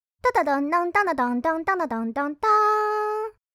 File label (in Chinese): 来电 诺基亚; Hanser手机提示音包